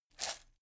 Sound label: chain mail armor movement